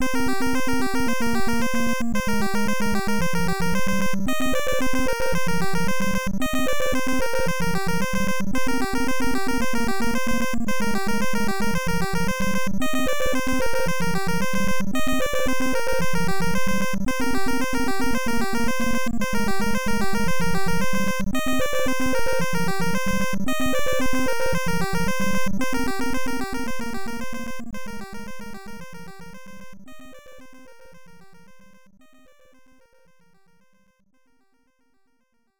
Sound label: Techno; Door Door; Family Computer